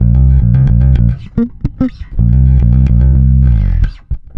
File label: NASTY C, Bass; Spectrasonics; Bass Legends